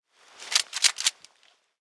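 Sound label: Weapon bb gun jam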